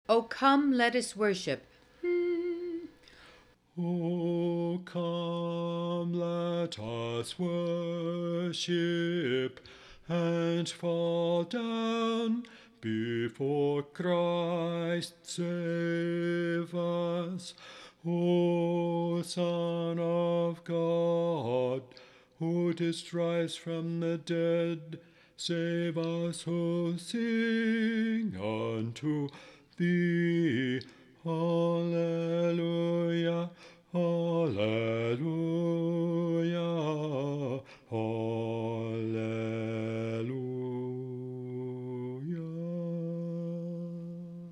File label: come let us worship bass